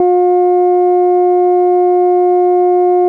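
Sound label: Yamaha CS80 F# 5; ESOTERICANLG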